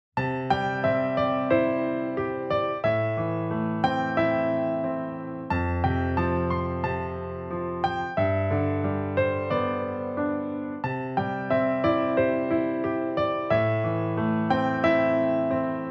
uspokaivayushij i krasivij romanticheskij fortepiannij fonovij zvuk

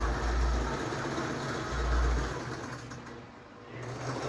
zvuk shvejnoj mashinki; v barra du turvo

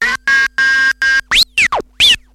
raznie pisklyavie zvuki

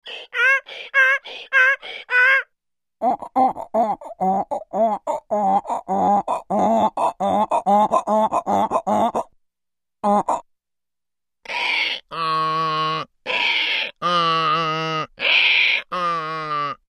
krik osla imitaciya golosom

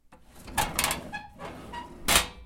otkrivanie i zakrivanie metallicheskoj korzini othodov

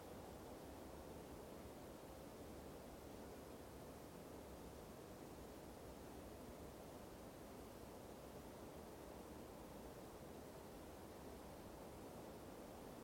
priblizhayushij neizvestnij shum

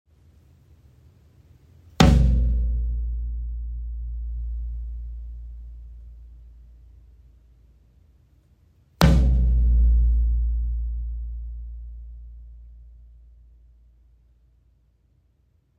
zvuki napolnogo toma

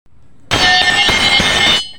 padenie metallicheskoj trubi

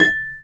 knopka cifrovoi visokii zvonkii rezkii

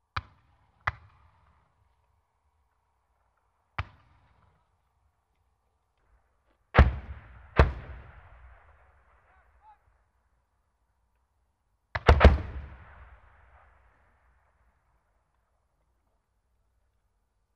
minomyotnij ogon so vzrivami

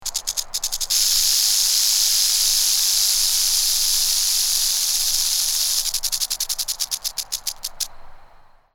gremuchaya zmeya tryaset svoim hvostom

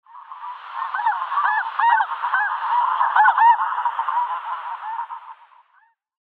krik gusej letyashih vdaleke

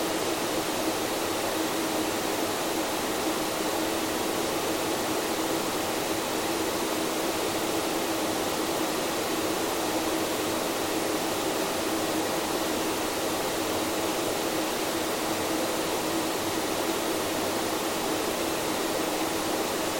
kalibnojz gostinaya

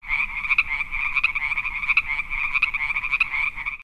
lyagushka hor perehodnij zvuk; film varete

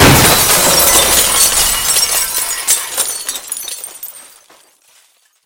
moshnij pronzitelnij zvuk razbivshejsya posudi

